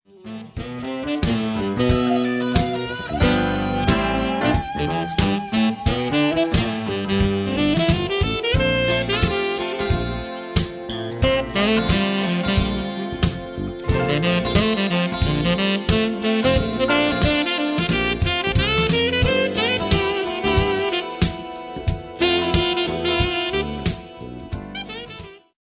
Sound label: jazz pop